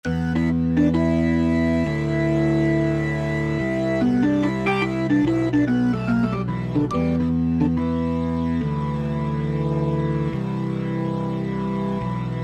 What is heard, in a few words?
3 Draw Rock, Alesis QS8; Factory Preset examples